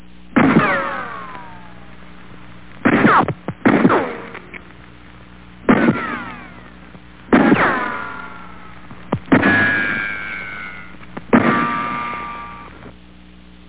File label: RIFLE; Geneve